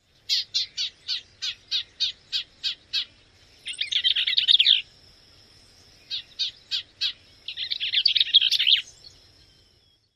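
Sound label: Bell's Vireo calls and song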